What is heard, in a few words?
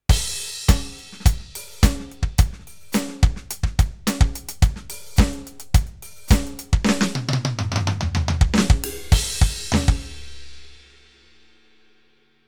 Roland TD07 Solid Rock